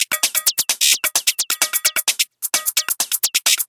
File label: Beat